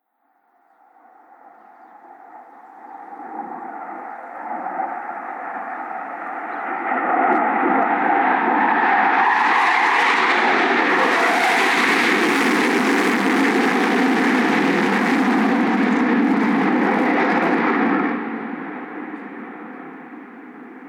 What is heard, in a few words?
War Plane Afterburners Extreme Fly Over, jetfighters extreme noise; Airplane; Andrew Fleming